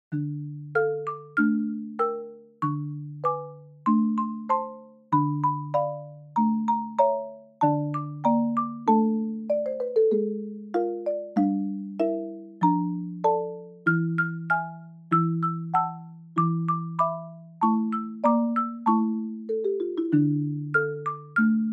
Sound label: Dance Of The Sugarplum Fairy Straight